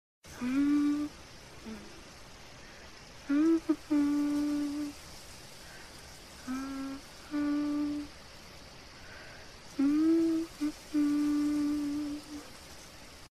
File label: Quiet Humming